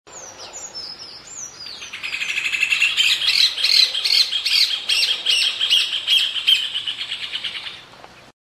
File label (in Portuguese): João-de-Barro